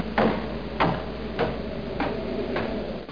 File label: steps